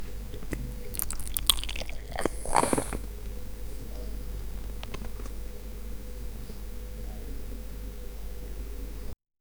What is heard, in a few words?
drinking with straw